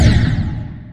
death sound; geometry dash

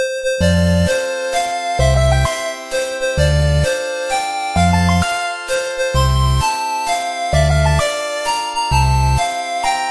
8 bit happy birthday

